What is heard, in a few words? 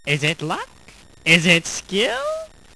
happy; CrossCards 1.0; Joker